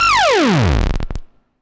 Scratchy, FX; Vengeance Samples

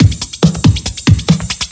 Breakbeat; Vengeance Samples; VEC2 Loops

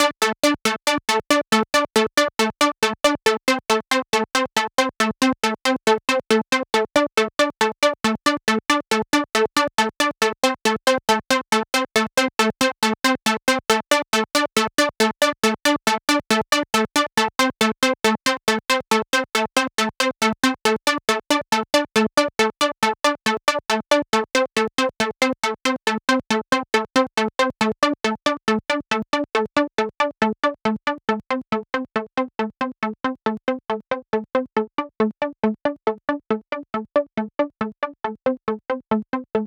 Synthline 138BPM DRY, D minor; Melody & Synth; Vengeance Samples; VTS1 Nightfly Kit

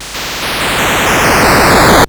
Arcade Riffs

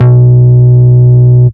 Root B, Ultimate Bass; Vengeance Samples

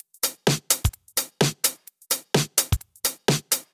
Drumloops 128BPM

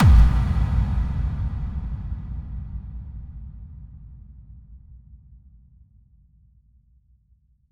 bassdrum reverb FX kick; Vengeance Samples

